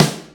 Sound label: blow, snares real